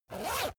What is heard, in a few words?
zipper use quick